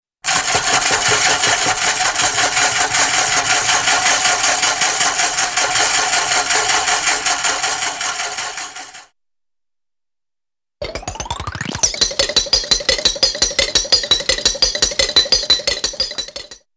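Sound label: Funny Engine Steady with noisy Metallic Rattle, cartoon; Hollywood Edge; Cartoon Trax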